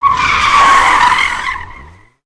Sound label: Cars Stop